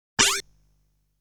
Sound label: Missile sound; legend of hero tonma